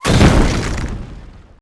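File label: impact builds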